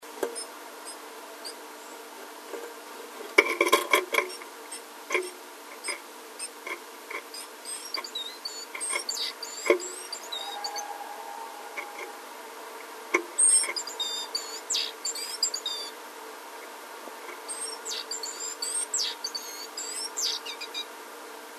meise leise rufe; nistkasten

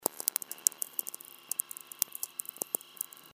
Feld Hinten, Natural Radio

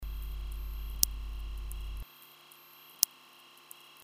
netzbrummen beispiel; fft

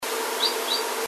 meise rufe; nistkasten

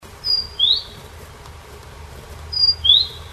meise, nistkasten

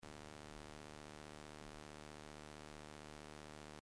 EFA Recorder Blechdose

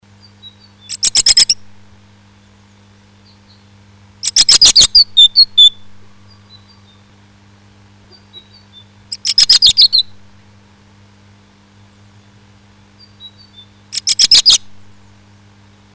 unterhaltung, nistkasten

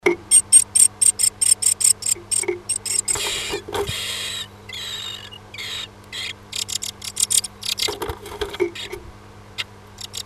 m fuettert w, nistkasten

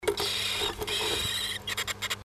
m fuettert w, nistkasten

